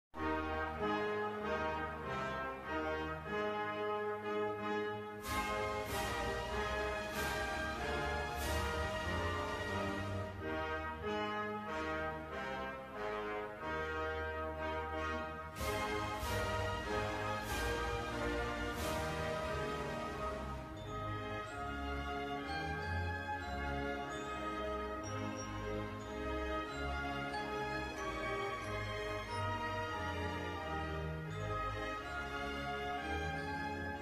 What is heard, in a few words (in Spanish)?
Himno nacional de Australia Instrumental